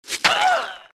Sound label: Death by arrow